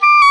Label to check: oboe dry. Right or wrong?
Right